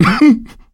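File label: laughter, male; survivor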